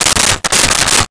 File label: Electric Staple Gun 5 Times; Tools